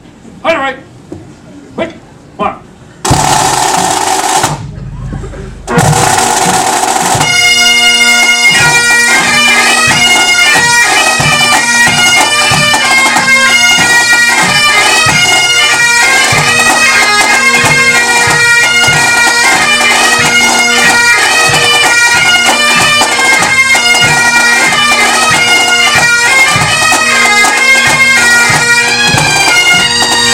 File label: 2 4 Marches, bagpipes